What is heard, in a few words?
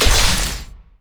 Shield; orianna